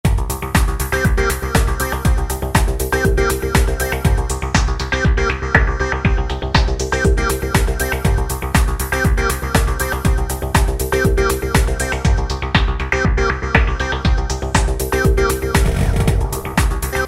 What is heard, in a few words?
Filter Beat; Roland SRX-05 Supreme Dance